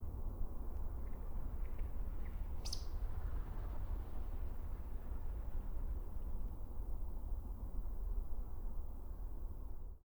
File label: Eurasian Woodcock